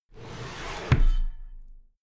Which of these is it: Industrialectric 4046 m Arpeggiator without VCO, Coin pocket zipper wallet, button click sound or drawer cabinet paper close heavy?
drawer cabinet paper close heavy